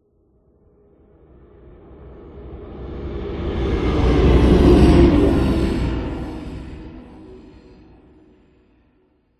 Passby Designed Whoosh Futuristic Scifi Airy Synth Spaceship Slow; PMSFX